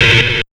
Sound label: HARD 130 FX, Big Beat; USB Soundscan vol.25; AKAI